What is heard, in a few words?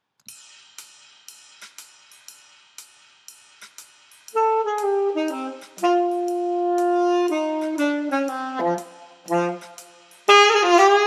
alto jazz